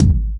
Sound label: KICK, DANCE